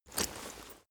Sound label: leather metal walk normal single